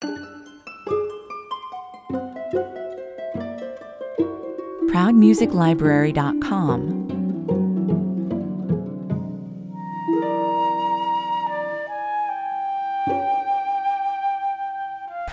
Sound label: 72 bpm, An enchanting, atmospheric chamber orchestra piece